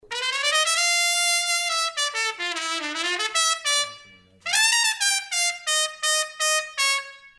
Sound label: african rosewood straight, mutes